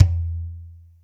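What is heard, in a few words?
TOM soft; Zero-G; Chemical Beats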